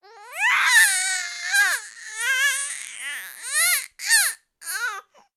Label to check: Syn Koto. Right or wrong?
Wrong